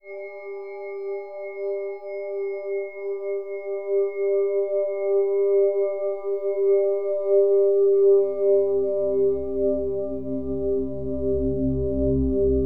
5THS VIB; Bolder Sounds - Granular; OPAQUE

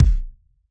rock kit kick

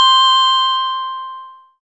SYN BROKEN, SYN MicroWave; Sound & Vision - Gigapack I CD 2

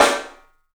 PIC SNR L, PICOLO ROOM, 3D Drums; 3D Sound

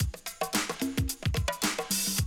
Classic DrumLoops, 110

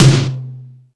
Lazer Drums, E-MU Pro-Cussion; drum machine

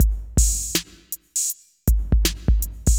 Synth Loop, BPM 80

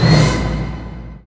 HIT ORCH.; Roland LCDP08 Symphony Orchestra

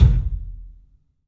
PA kick; E-MU Producer Series Vol. 5 – 3-D Audio Collection; 3D Percussives

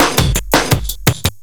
LOOP SD, 168 BPM, Drum & Bass

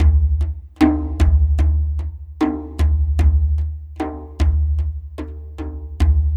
Dumbek Slow, Ethnic Percussion; Sonic Foundry (Sony Creative Software); Ambient Atmospheres & Rhythms

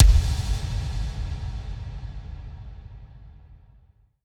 FX Kicks

